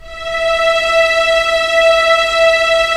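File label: string section; Roland - LA Composer vol. 1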